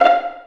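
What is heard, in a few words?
Vio pizzicato F4; Digital Sound Factory Virtuoso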